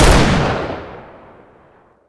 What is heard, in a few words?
Six Shooter Fire; weapons; Scout; tf2classic